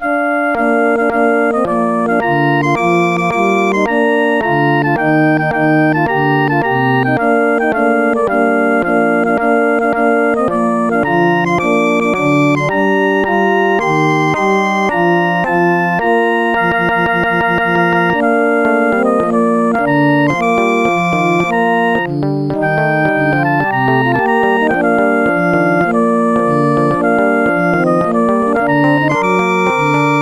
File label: battle hymn; Instant Music